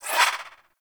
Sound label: Swing, Weapons Melee; Warframe; Modular Melee